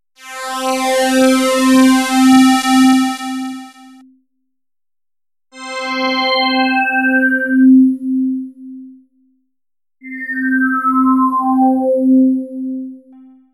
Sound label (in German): Wavegenerator Beispiel Table Checker